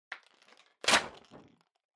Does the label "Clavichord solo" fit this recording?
No